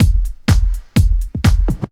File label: drm 125, drumloops percpattern; house; PS-28 Vocal House Samples